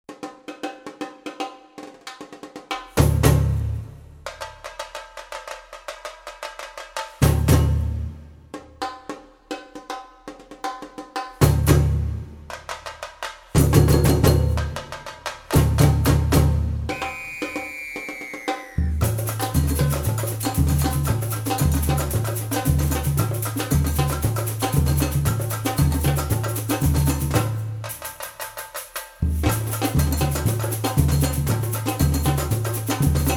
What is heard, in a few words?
Street Samba